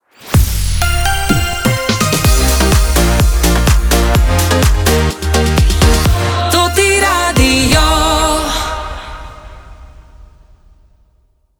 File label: radio jingle hot; Toti Radio